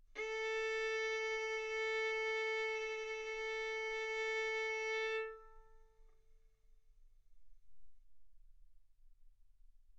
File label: Violin arco A4 B4